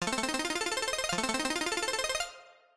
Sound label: reveal; project hawk